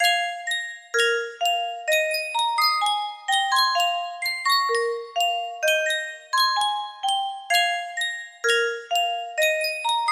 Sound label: Sankyo music box, music box melody